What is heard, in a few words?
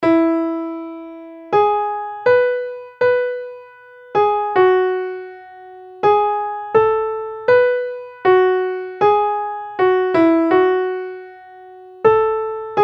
Melody; Practice Exam Example 3